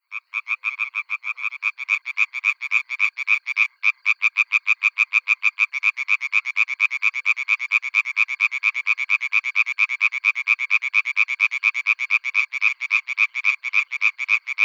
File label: Hyla arborea